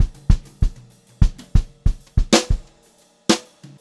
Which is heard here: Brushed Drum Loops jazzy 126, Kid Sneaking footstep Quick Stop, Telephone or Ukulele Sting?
Brushed Drum Loops jazzy 126